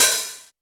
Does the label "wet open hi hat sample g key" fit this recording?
Yes